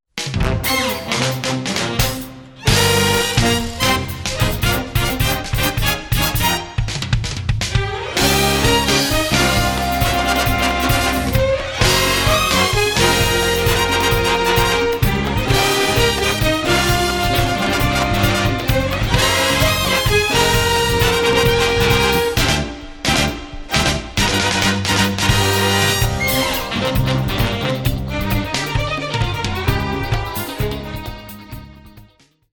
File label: jazzrock